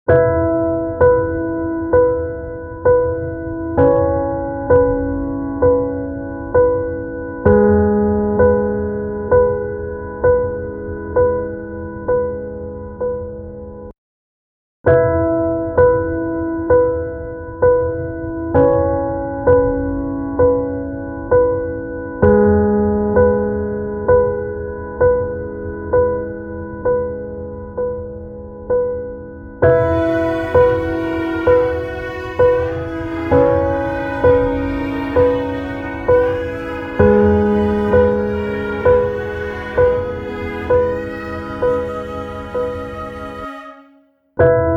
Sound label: instrumental